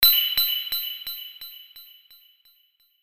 Gem Fanfare; The Gem Collector ps4 wii u windows gamerip 2013

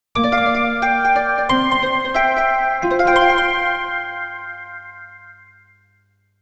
Morning's Jingle

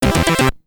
Reach Starting Point (SID Stereo), commodore 64

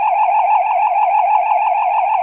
Pack Shield Loop

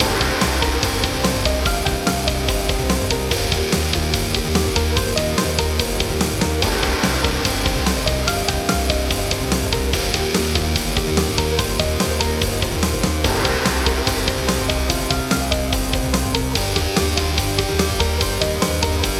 Samurai Music; Super Samurai Rampage android ios mobile windows gamerip 2017

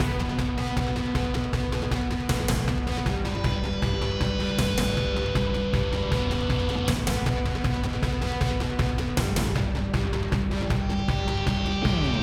Tornado Combat MIXED; ben 10

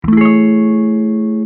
Notes Amaj7 Down; gamerip; Hylics

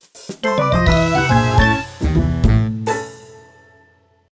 Bonus Lose; donkey kong country returns 3ds wii wii u 2010

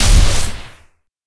Sci-Fi Weapons-Sniper Rifle Single Shot

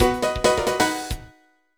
Jingle Star; Super Mario 64 DS; NDS

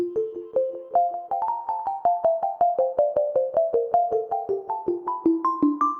Music DownBeat Synth; Plants vs. Zombies Battle for Neighborville; GnomeGnight

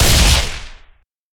Sci-Fi Weapons-Sniper Rifle Single Shot

